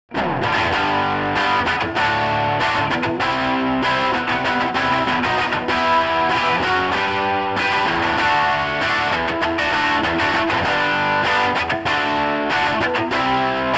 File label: flying v rhythm guitar